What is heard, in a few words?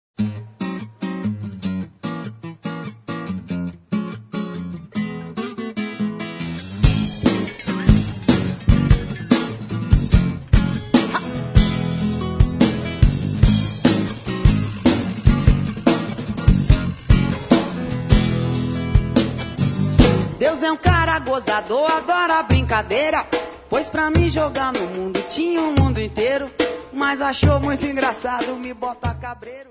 partido alto